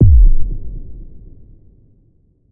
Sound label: Cinematic Impact